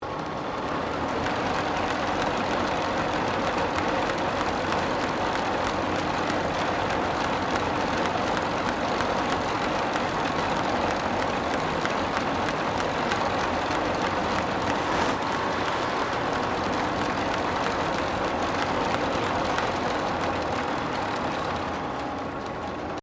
Wheelwright Tennonning Machine Operating, Colonial era machinery; Machines